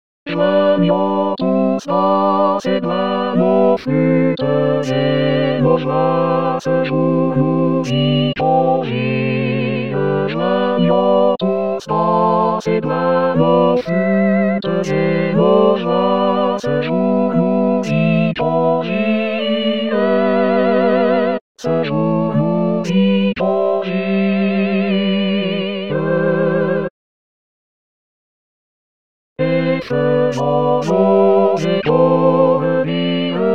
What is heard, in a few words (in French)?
joignons flutes do tutti; 2020 st jean